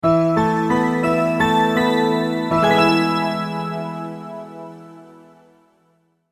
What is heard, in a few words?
multimedia logo, Musical Identity/Signature